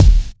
thumpy kickdrum e key, Royality free kick drum single shot tuned to the E note; kick drum samples